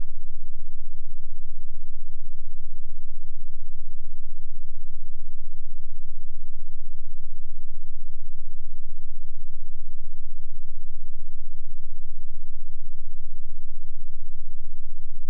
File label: schumann 7.83hz